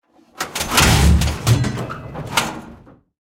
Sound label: howitzer shot mech; cannons; War Thunder